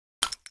12cal shell soil, shotgun; bullets

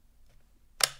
opening sights; weapons launchers; AntiTank; ALAC

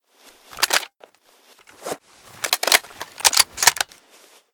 AWC reload, sniper rifles; 308 MSR

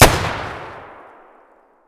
fire distant, weapons; BOITO

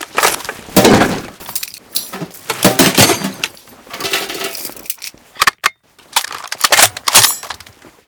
reload belt

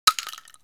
12cal shell concrete, shotgun; bullets

